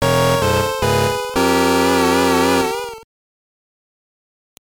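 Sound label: Jingle, Game Boy Color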